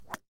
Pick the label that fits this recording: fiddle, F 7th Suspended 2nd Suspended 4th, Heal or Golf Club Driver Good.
Golf Club Driver Good